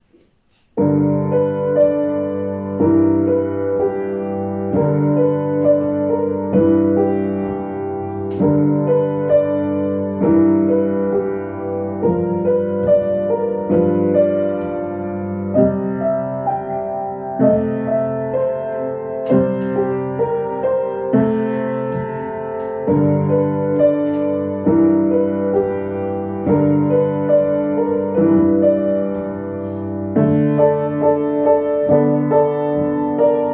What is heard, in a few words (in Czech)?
blues sky klavir